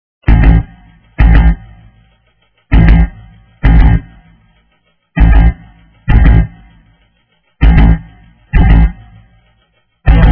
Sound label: bgm; muso